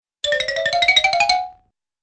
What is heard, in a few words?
xylophone piling up